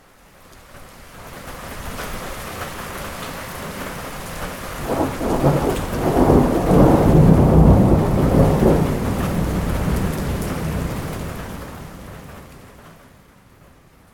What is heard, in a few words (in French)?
Orage sur l Himalaya